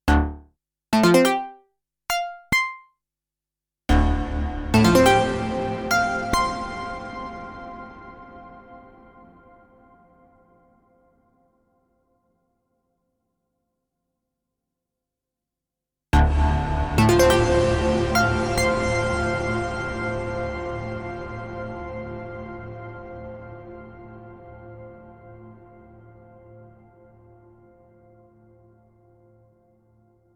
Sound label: Modal PPGesque Alle Typen